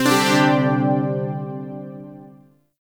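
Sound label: Shawzin Pentatonic Major Chord; Zariman Shawzin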